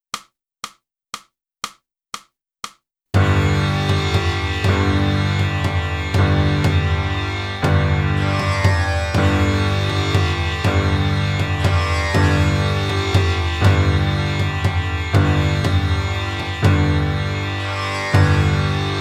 flute; boosey

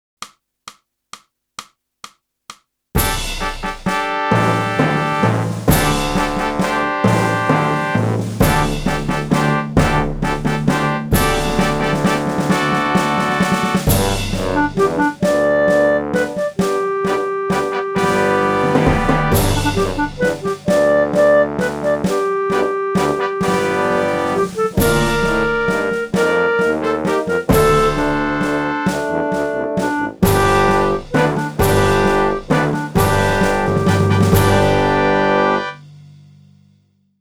clarinet; boosey method

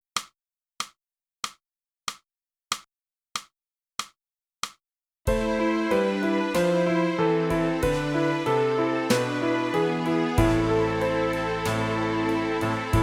flute

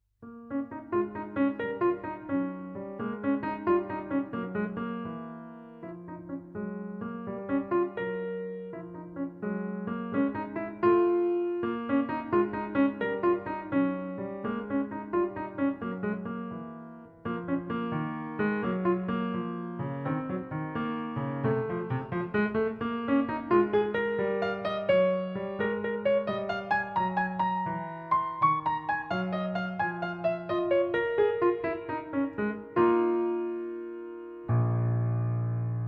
piano

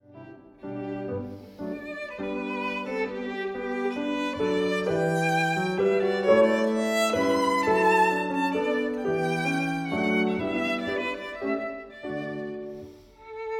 VIOLIN

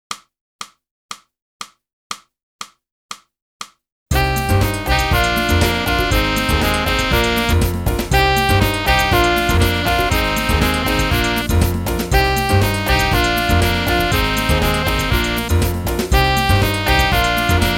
sax

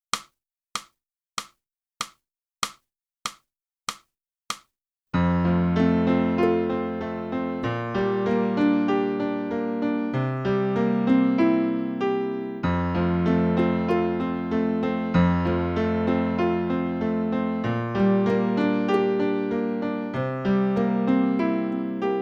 flute; Boosey Woodwind Method: Flute (Book 1)

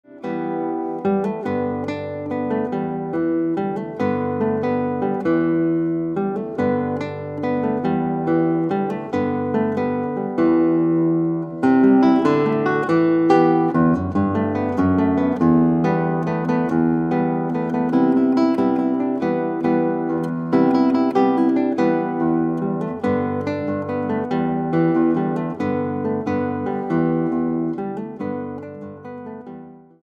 Guitar

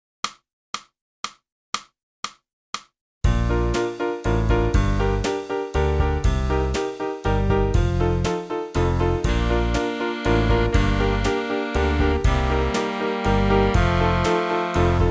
sax

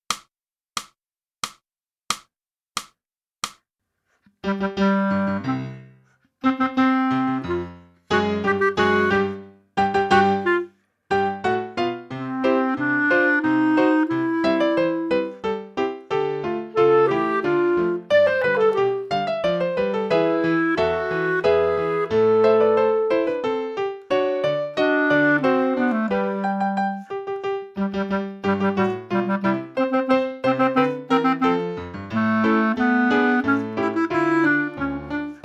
clarinet, dance with trumpets; (Morgan) - Boosey Woodwind Method: Clarinet (Book 2)

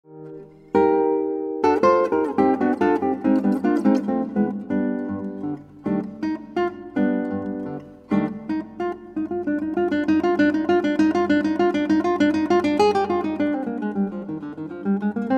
Guitar

